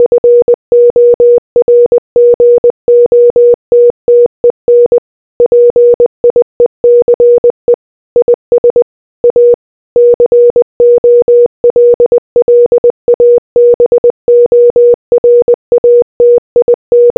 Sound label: morse